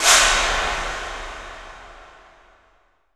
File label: Impacts